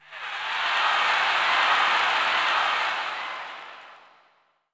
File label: crowd; FIGHT; FXF DEMO